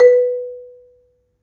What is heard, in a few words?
B2, Marimba; Terkelsen